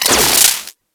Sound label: Split Laser Beams Join; Warframe; Corpus